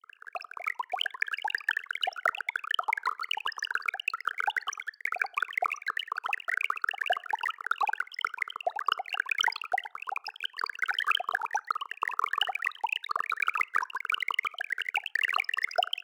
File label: water